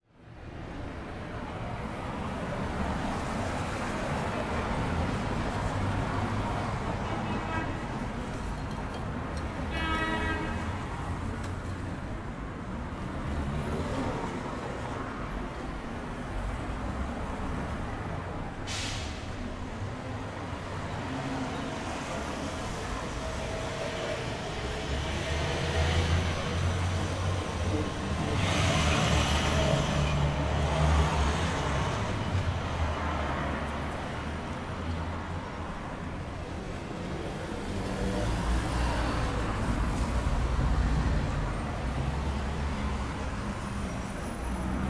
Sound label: Relaxing Sounds; Picross S5